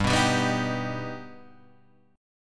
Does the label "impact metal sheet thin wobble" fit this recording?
No